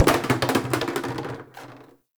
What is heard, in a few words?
Plastic Hit; Warframe; Ambience; Nineteen Ninety Nine